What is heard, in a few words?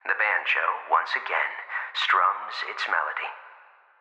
Banjo; DIA Sammy Puzzle Diary